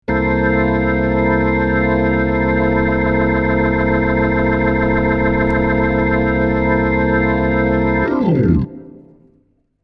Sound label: Leslie ramp up down